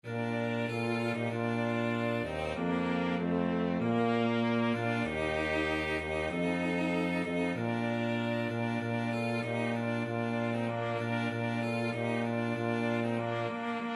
joy to the world, flexible mixed ensemble 3 players